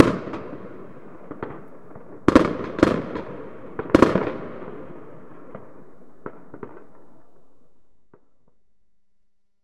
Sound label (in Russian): novogodnyaya noch kanonada